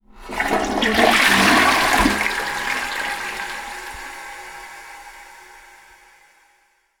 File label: toilet; albasfx